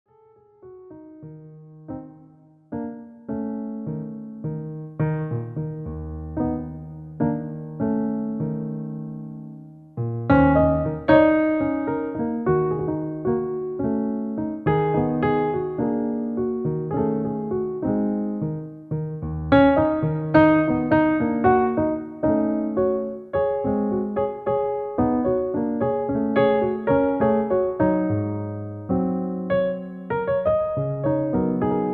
Eb minor blues

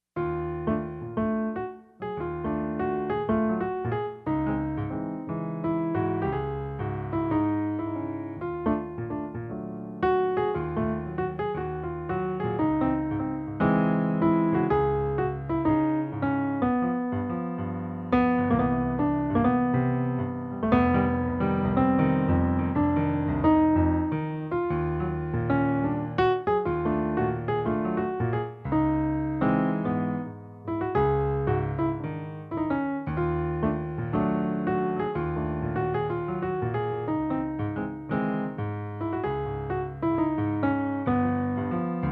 spanish jump waltz

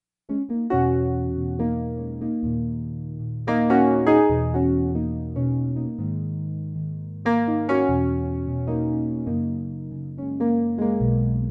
black key blues

